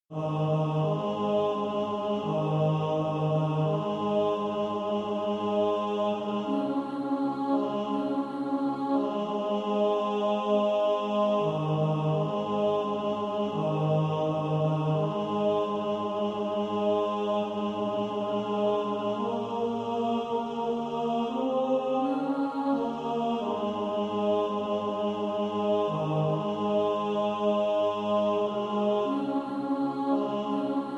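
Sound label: tenor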